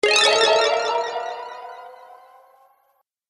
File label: Cartoon Magic Illusion